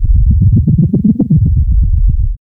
SYN-FX

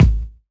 BDRUM; E-Lab; X Static Goldmine 2; akai

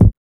HSE BD

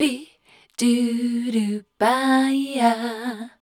MEL C, Voice Spectral

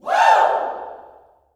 "Woo", Vocal Gospel yell shout

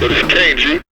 VOX FX

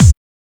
HSE BD

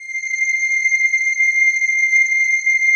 PAD, ATMO PADS; Best Service; XX Large

